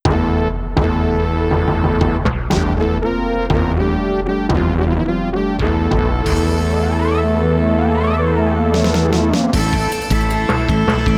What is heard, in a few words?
End Sequence [Roland LA]